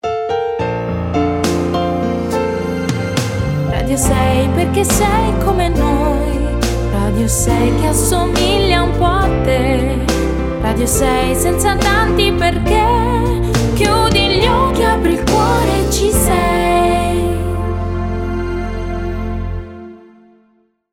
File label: Jingle